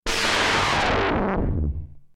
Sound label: turbo Start